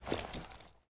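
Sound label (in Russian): sborka pokhodnogo snaryazheniya v meshok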